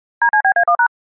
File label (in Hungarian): Magyar adas bekapcsolo Jingle; Nagyvaradi atvalto